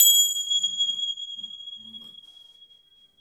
BELL, BELL TREE; AKAI S-Series CD-ROM Sound Library VOL-5

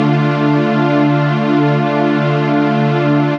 Atmos Pad; Strings & Pads; Zero-G Sound Sense Grime Scene; 90 s Sample CDs

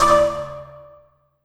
PERC, 170 BPM; Ueberschall; Drum & Bass Flash Trackz

